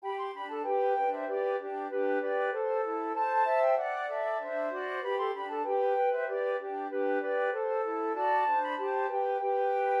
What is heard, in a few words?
flute trio Irish reels